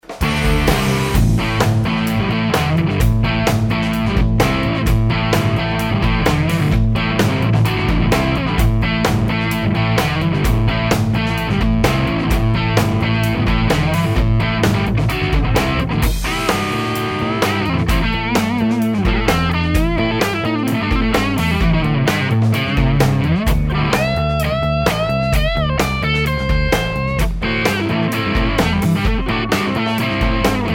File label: higain neck, Tele; schwarzbach; VP-Tele-57